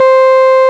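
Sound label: RMI piano C 4, EP; Sonic Reality - Vintage Timetraveler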